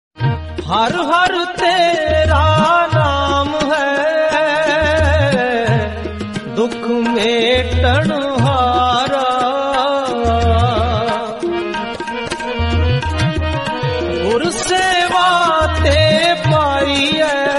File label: bhajan